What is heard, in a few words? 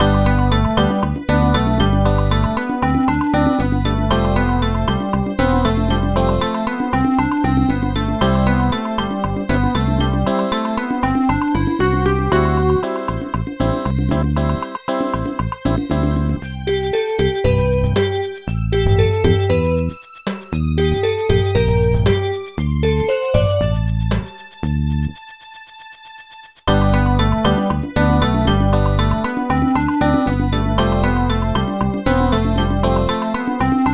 Upgrade; Burger Bot; NDS